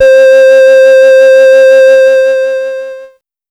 Casio VL1 Fantasy; Giga Samples Collection

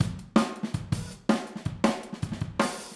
Overhead, Drums, 162 BPM; Verse; Overhead ST

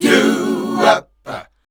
Voice Spectral, H PHRS

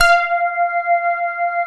SYN ORGSY, SYN DIGITAL; Sound & Vision - Gigapack I CD 2

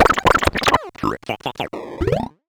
Bent Talking Teacher, Circuit Bent

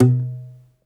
domra, plucked strings; Segundo Domra; E-MU Producer Series Vol. 7 – Old World Instruments

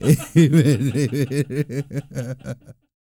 LAUGHS; Spectrasonics; Vocal Planet; Jazz FX

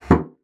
bassoon slap; Xsample VOL-6; C BASSOON

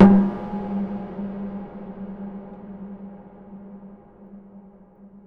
Snare Hit, Impact Drum Hits; Sonic Foundry (Sony Creative Software); The Electronic Music Manuscript Collection

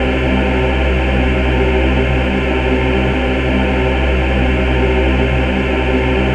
Doom Ahh; SFX & One-Shots

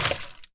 Plastic Packet, household rhythm samples hits

